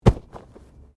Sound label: Body movement Fall Medium; Body movement foley to simulate falls and deaths; Wrestling And Struggling Sound Effects